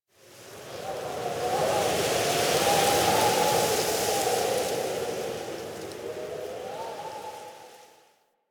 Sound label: Night Wind Gust Medium; Warframe; Ambience; Eidolon Plains; One Offs